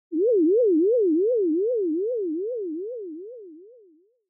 Fantasize Long High; シーン・妄想

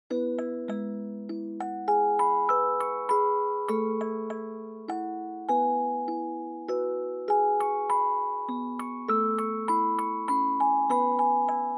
Akatonbo Dry Fast

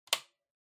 Calculator (Switch Power)

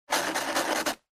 Ballpoint Pen Fill

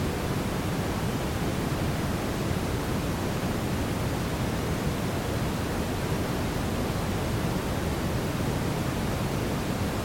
Brown Noise Mono Loop

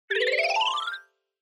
Scene Change Long

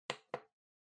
Electric Fan (Switch)

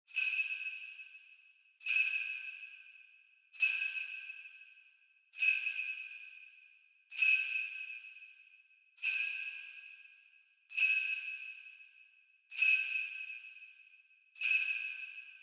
Sleigh Bells Twist Gentle

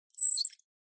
Padlock hook

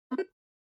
OS Sound (Speaker-Notification)

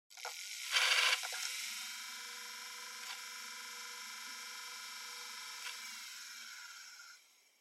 Portable MD Driving Noise Seek

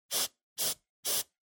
Air Duster

